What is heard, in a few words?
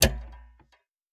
Safe Open; MiSide